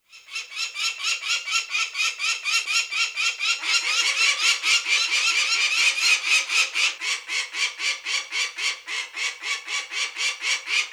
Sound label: Peregrine Falcon